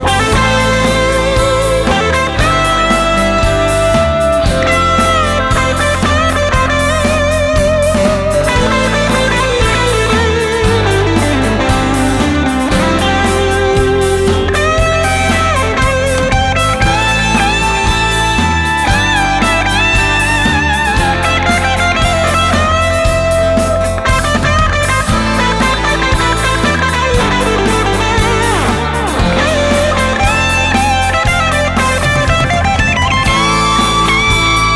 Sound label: Guitar Solo